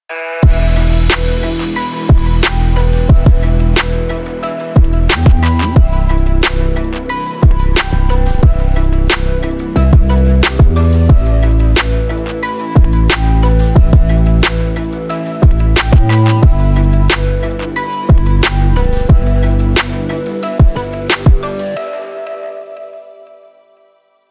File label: technology extreme sport